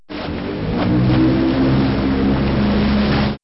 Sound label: speedboat